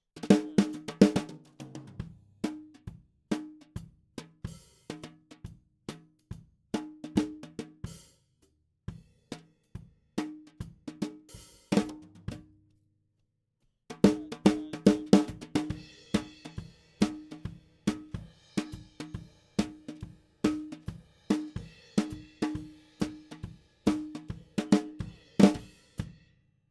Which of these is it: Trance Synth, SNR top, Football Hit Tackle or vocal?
SNR top